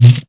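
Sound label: laser tink; zaero; weapons; ired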